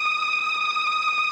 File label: ORCHESTRON D#4; Sonic Reality; Vintage Timetraveler